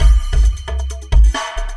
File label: beatloop; DirectX SDK; DMusProd